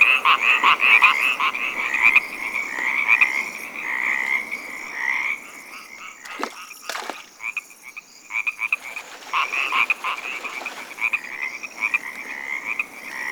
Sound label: Exterior Lake Critter Loop; Warframe; Duviri; Ambience